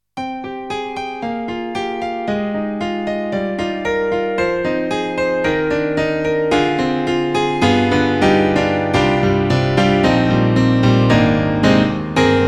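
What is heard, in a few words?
KORG N1R Concert, piano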